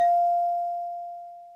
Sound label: wheel ding